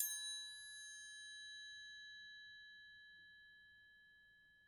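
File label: muffled ringing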